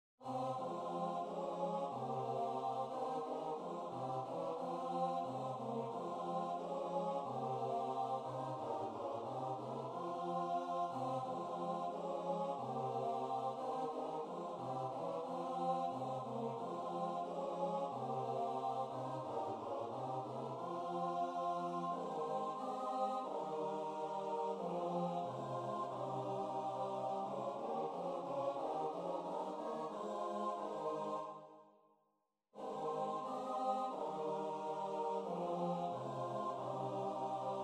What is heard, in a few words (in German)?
satb Chor; Auf der Flucht